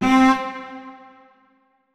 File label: notes, keyboard; Hideout Furniture